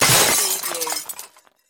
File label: Junk Crash; Action, Impacts & Explosions